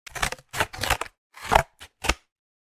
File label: Scissors cut cardboard